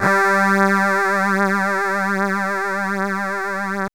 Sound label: PAD; AMG - Vince Clarke Lucky Bastard